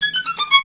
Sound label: STR VLN, Solo Instruments; Miroslav Vitous